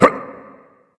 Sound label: grunt jump dragon; fafnir